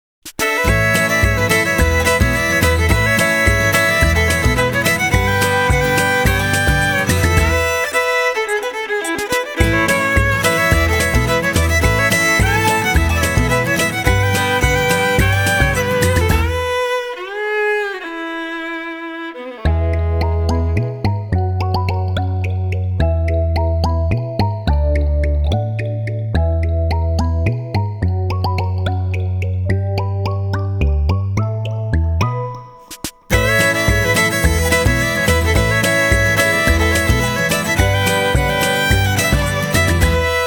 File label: fiddle splendor; karei na fidoru